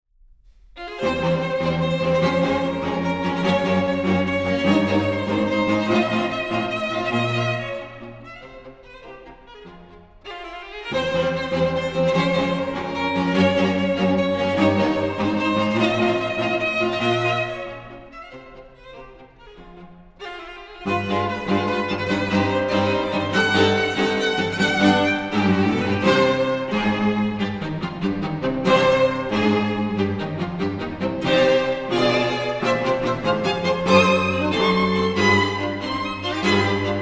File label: Quartet